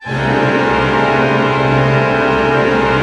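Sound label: cluster fortissimo, Orchestra; Sound On Sound - Peter Siedleczek's Orchestra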